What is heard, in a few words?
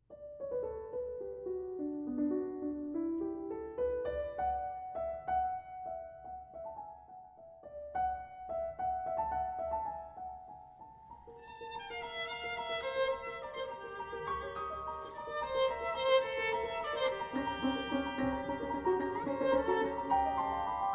Sonata For Violin and Piano; Ravel; 1. Allegretto